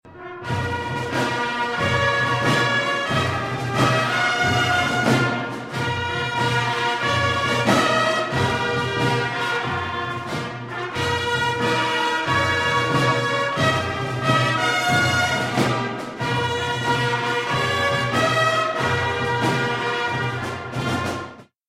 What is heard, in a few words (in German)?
Fanfaren Blues